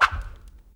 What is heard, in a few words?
whip